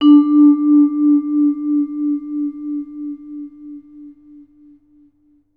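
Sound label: VB VISL D5, vibraphone; Best Service; Peter Siedlaczek's Advanced Orchestra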